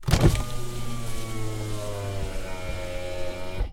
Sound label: Electric Windows Roll Down